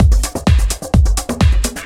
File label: Housebeats, 128BPM; Vengeance Samples; VEH2 Loops